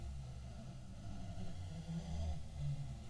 Rope Litt; Puddle

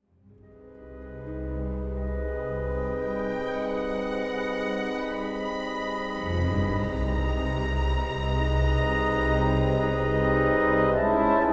Suspense; Star Wars Obi-Wan

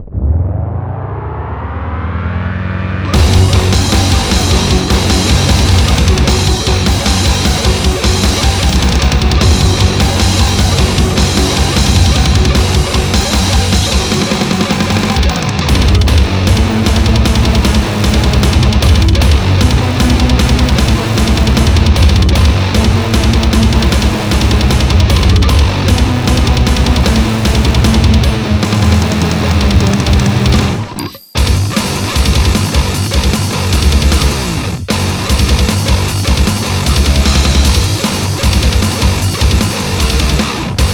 Boss fight; PositronX

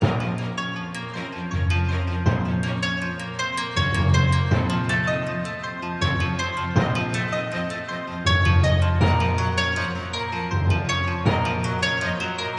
Knight Fighting Long; Inmost

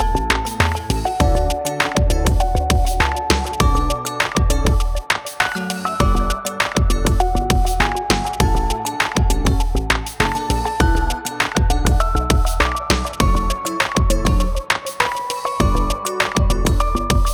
Hip Hop Anthem Loop Start

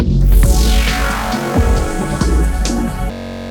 victory sting; AirMech Command VR

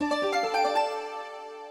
Creature rescue; Super Mombo Quest

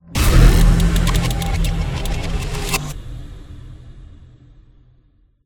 Death UI; biomutant

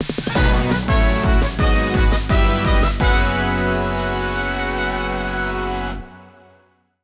Jingle Final result; Goonya Monster

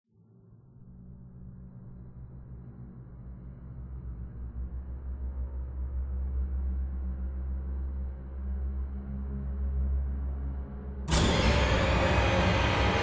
Horror Scare With Intro Long

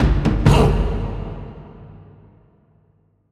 Success; Zuma Success

